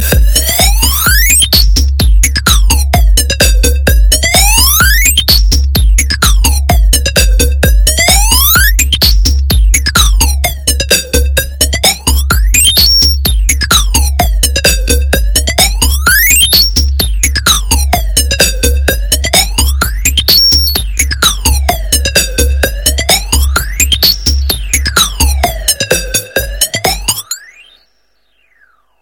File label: Hearing Test Warning